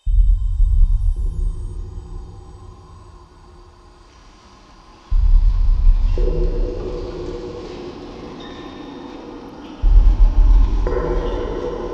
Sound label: amb doom drones stream; SoundMorph